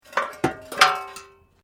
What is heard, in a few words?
Metal Light Movement Minor